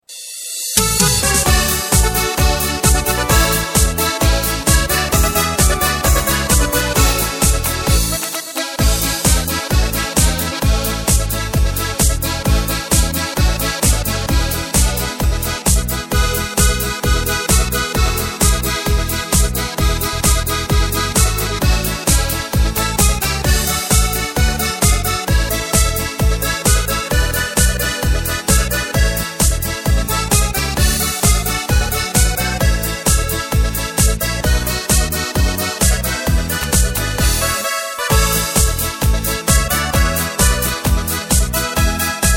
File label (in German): Schleifer Medley Playback